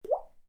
Mouth Drop

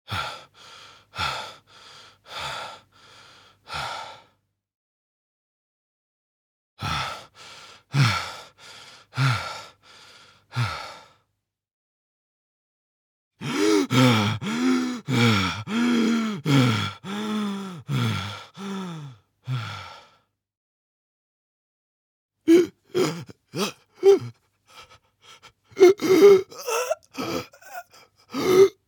Player Out Of Breath Male

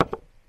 Setting Down Small Hard Object

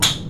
Golf

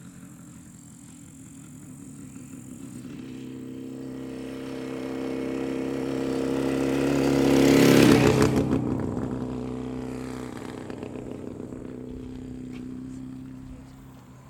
Old Motorbike Passing Empty Dirt Road

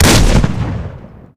Remington

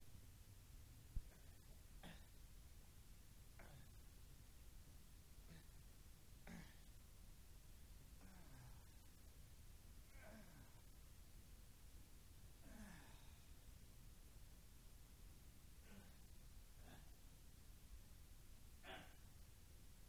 Fatiguing Grunts

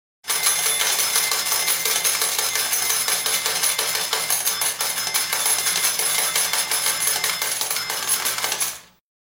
Slot Machine Win Coins Sound

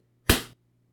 Face Body Being Punched

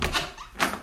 Door Open

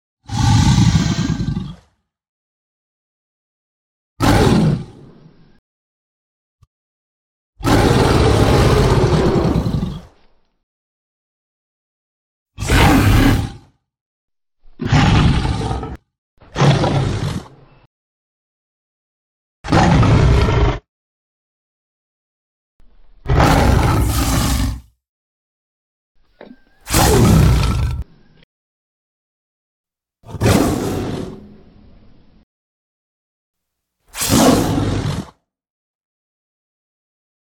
Lizard Growling And Attack Roar Sound; Custom Insomiac Inspired

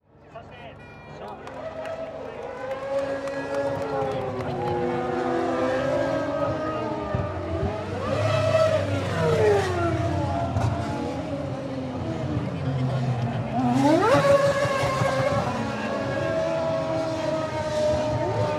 Parade Lap; 2009 f1 jp gp

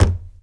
Thump Big

